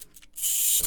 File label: Rewind Robot Toy